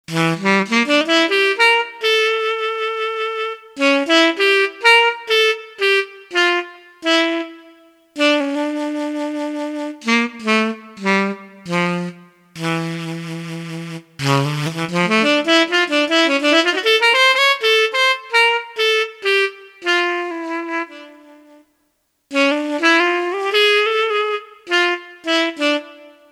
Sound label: moody sax